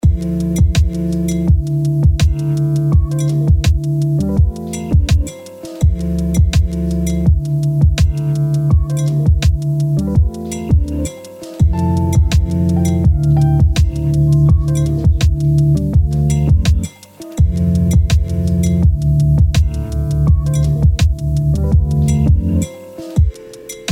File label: funky sketchy Vinyl